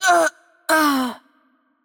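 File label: Brazilian Portuguese Death; VO; bloom minigame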